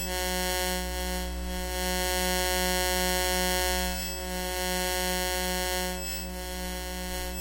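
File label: ambient hum buzz loop, electric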